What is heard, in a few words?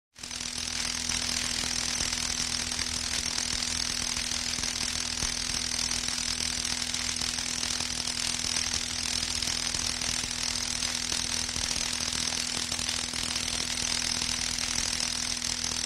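sound of electric current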